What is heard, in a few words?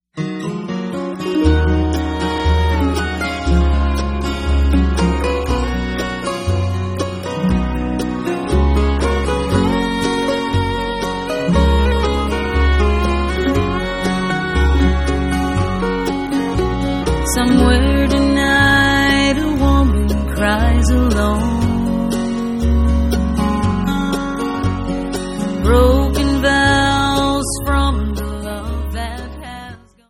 bluegrass